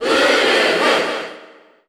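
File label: Cheer Japanese, crowd; Wolf; SSBU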